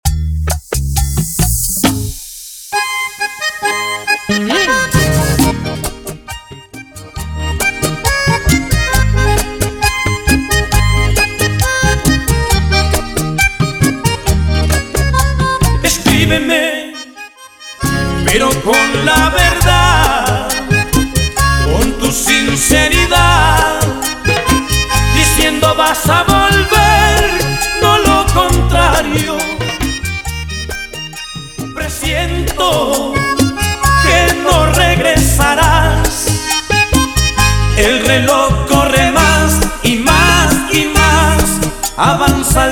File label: 135 bpm